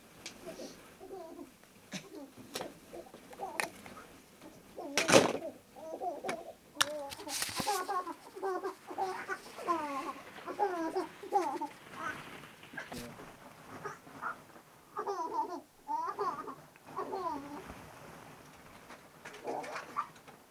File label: laugh